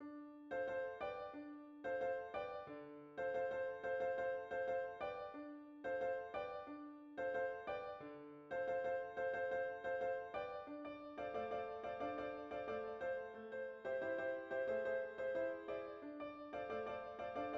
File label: Berimbau